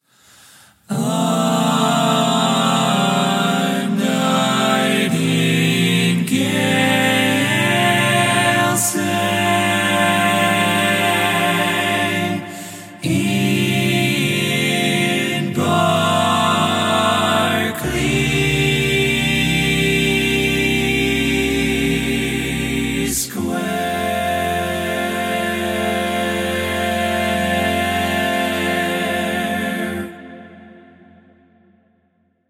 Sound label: All Parts, barbershop